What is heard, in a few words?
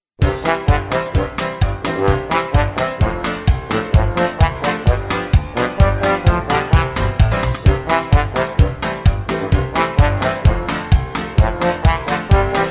jingle bells